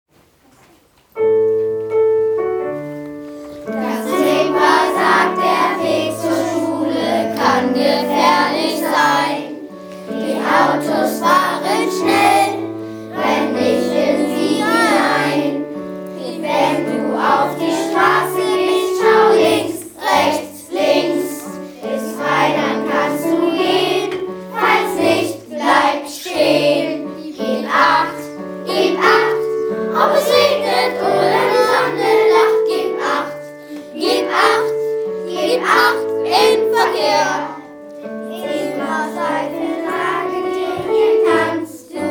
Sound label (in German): gesungen von 3a und 3b; Gib Acht im Verkehr